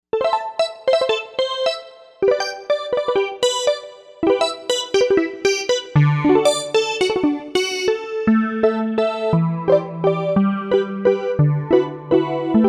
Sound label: Novation UltraNova; Factory Patches